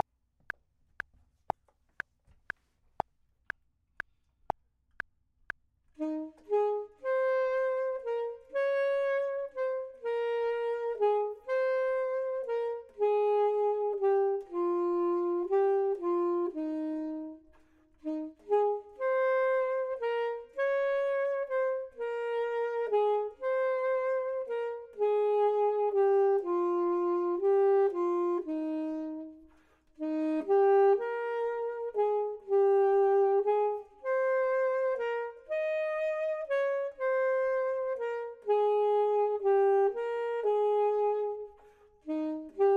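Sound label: top, Saxophone and Clarinet; voxman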